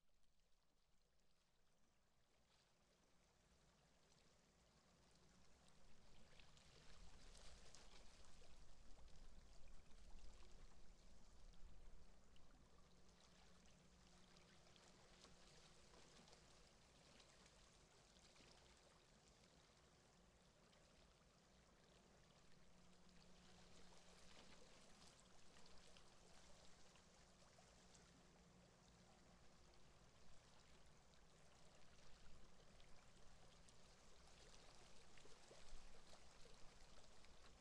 穏やかな波の音

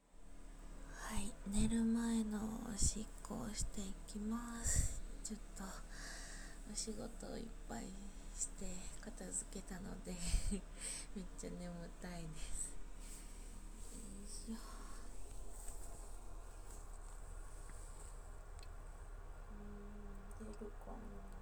トイレでオシッコ; ６日目・寝る前の

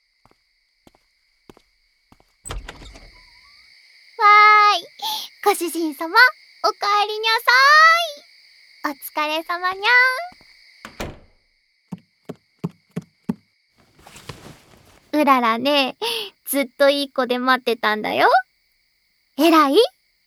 耳かき; 耳かきでみんなを癒すにゃん♪; このお耳、うららだぁ～いすき

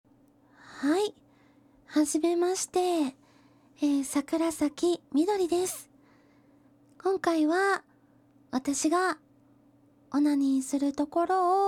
初 ガチ 実演 オナニー; THE FIRST ORGASM

